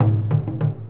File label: taiko; Ronin